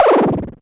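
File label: Klone Dual Percussion Synthesiser noise; KLONE FX NW8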